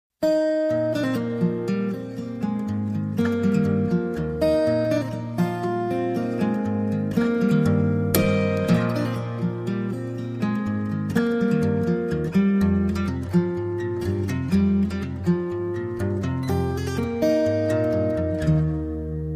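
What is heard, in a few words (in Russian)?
krasivaya melodiya na gitare, beautiful melody on guitar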